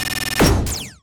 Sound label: Ui Block Break; Kasiori Arcade; Switch, Switch 2, Windows